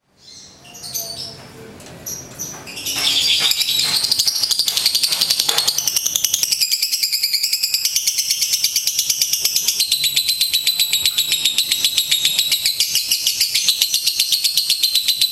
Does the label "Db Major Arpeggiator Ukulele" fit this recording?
No